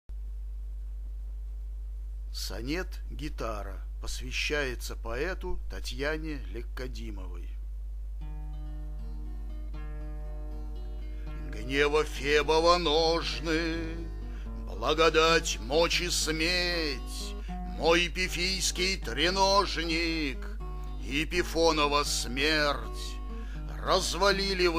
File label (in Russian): gitara